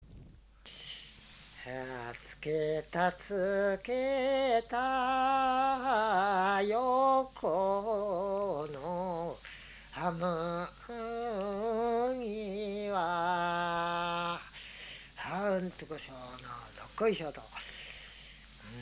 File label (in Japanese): mugitukiuta, minyou